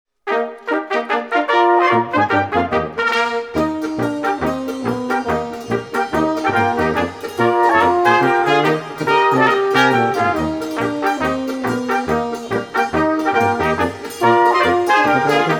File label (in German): Heimatabend Polka